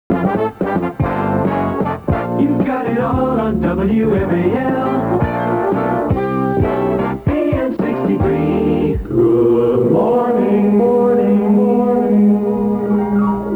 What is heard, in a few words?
radio jingle; wmal